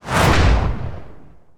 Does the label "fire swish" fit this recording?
Yes